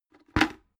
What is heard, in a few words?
bucket empty put down drop